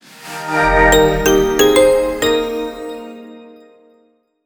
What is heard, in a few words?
Power On, phonetones; LTE-Ax4; SK Telecom